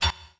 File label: thud click; NASCAR Thunder 2004